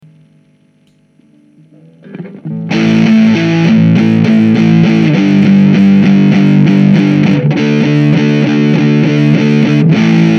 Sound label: riff